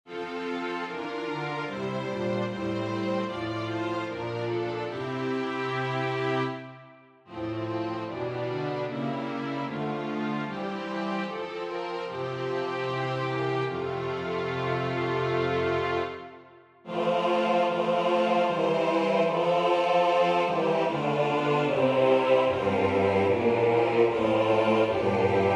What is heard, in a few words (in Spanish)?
BAJO; Salve Maria - patrona Regina Rosarii